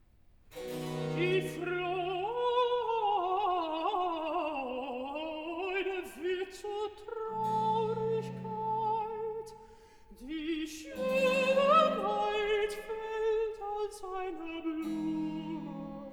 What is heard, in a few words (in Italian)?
Recitativo (Alt); Cantata, BWV 26 'Ach wie flüchtig, ach wie nichtig'; Bach; Romsey; 4th Sun After Epiphany